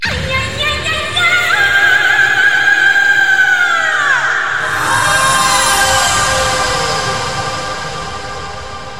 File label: Horriffing Tone